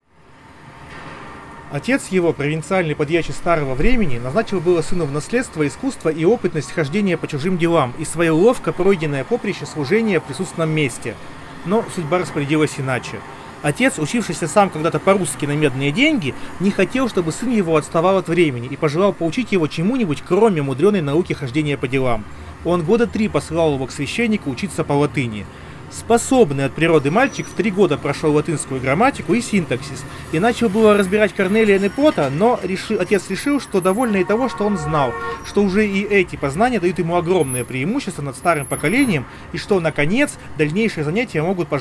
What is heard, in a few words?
Recorder noise; realme buds t01